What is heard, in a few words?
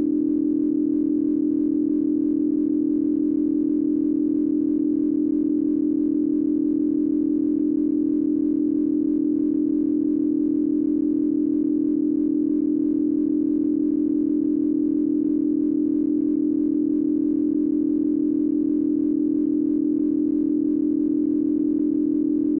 envelope test quasi gaussian